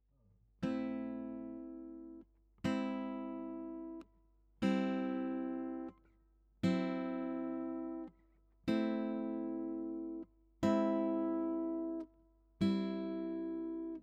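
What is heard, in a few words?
Descending Chord Progression, guitar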